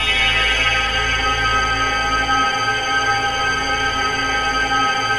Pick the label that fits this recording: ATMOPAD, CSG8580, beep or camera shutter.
ATMOPAD